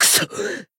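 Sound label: Voice damaged, Japanese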